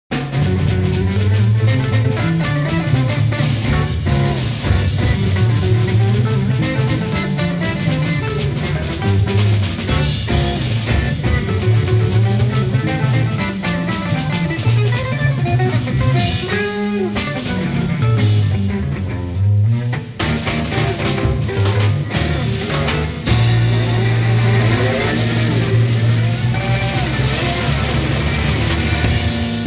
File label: Bass Part